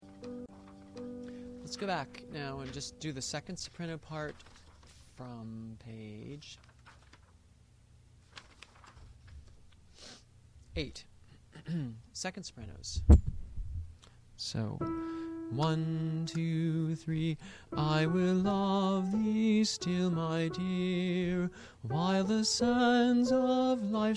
Soprano II; District II 2003; Mixed Choir Practice

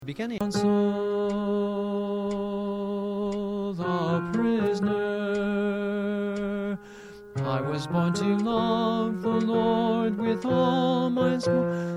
Soprano Coda; District II 2003; Mixed Choir Practice

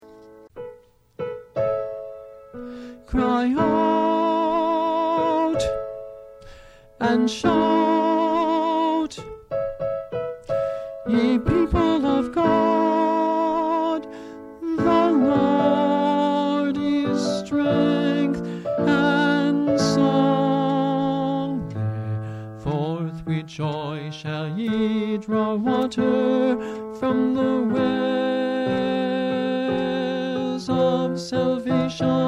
Tenor I; District II 2003; Mixed Choir Practice

